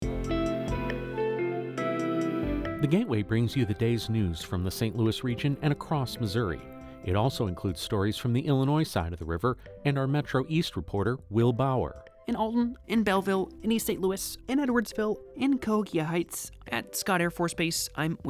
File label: Chorale; Black Tulip Chorale